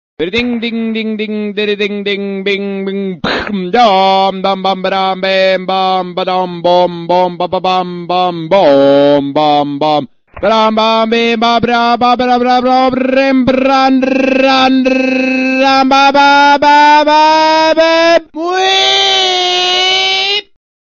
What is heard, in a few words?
low